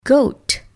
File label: goat